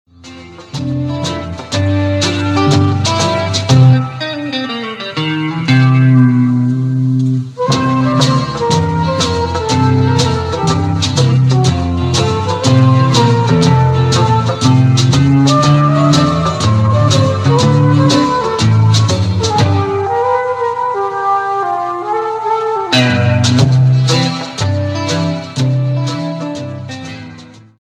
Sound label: trambone